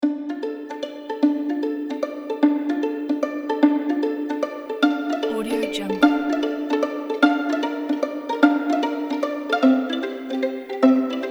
violin pizzicato classical duet; music2noise

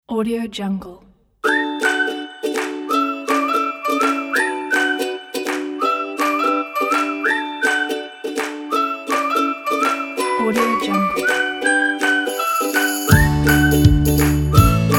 happy

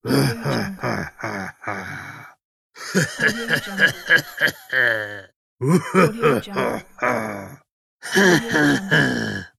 orc laugh

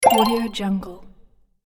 fun bonus pop

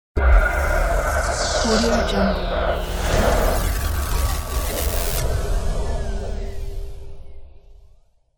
turn off

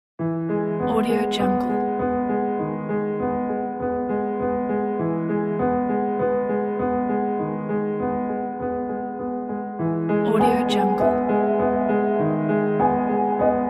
dream piano; archimusic

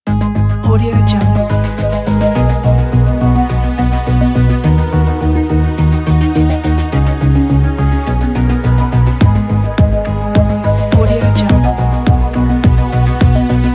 the 80s synth wave; anatolymusic

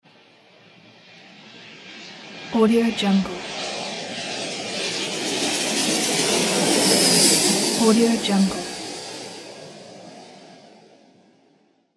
plane; studio-j

